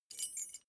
key ring mid size fly tinkle; objekte; schluessel

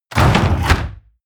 mechanical lever wood medium up; industrie-wirtschaft; mechanik

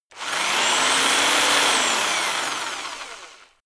rock drill rev high long; bohrer; soundpool

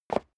step leather shoes concrete single stair up; schritte

